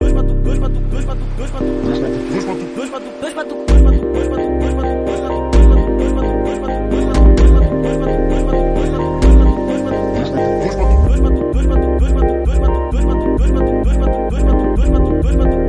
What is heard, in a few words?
montagem; spectral